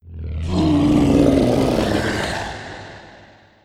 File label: dragon attack mouth; Stellaris; guardians